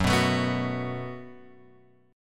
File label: F sharp Minor Double Flat 5th F sharp; F#mbb5 Chord {2 2 X 2 0 2} strummed